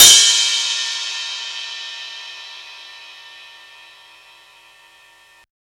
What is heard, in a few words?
Ride set, Drum and Bass; Ride Set 2; Instrument Samples